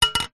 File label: glass pane small tap on metal; zapsplat